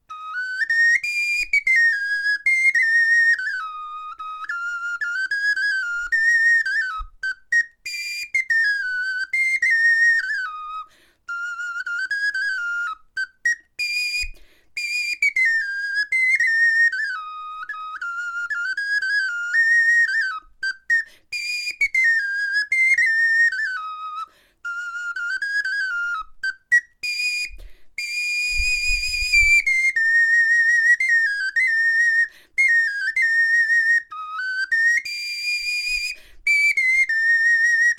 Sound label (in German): melodie